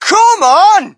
crowd, shout; Mike Tyson Heavyweight Boxing

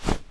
clothing scrape cement

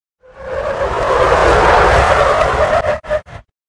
tire squeal; Soldier Of Fortune II Double Helix; XBOX; ambience

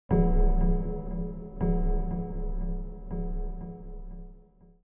hits; Myst III Exile

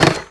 Rail Hit; XBOX; Amped 2

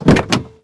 car door open; garage; Max Payne

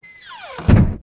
door; XBOX; Grand Theft Auto III

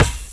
basket ball break

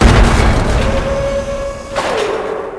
steel cutter; Max Payne; cold steel

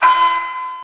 Elevator beep; Max Payne

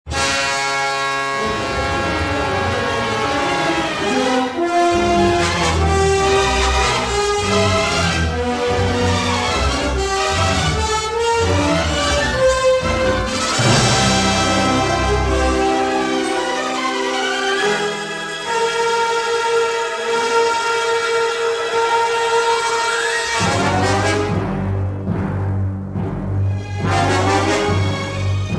action; Star Wars Jedi Knight I Jedi Academy; XBOX; t1 sour

